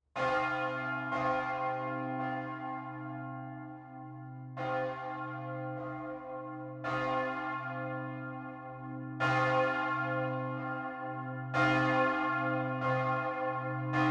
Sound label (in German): Glockengelaut; friedberg